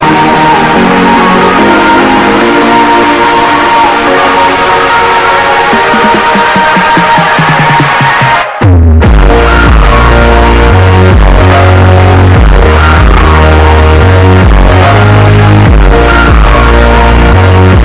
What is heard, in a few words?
outro bass boosted